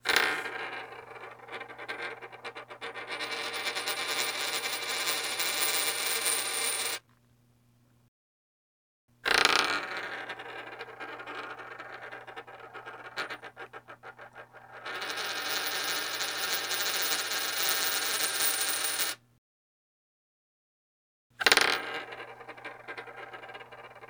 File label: Coin